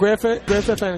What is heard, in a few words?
aggressniff